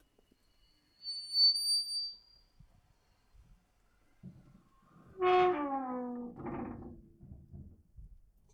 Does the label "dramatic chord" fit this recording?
No